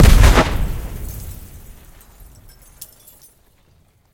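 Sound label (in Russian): громкий пушечный выстрел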